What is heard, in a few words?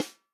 snare drum, HIPHOP SNARE CHROMATIC